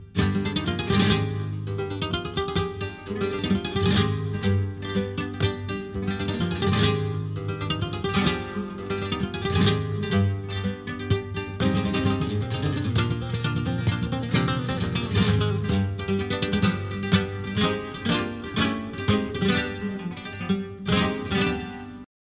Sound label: Solea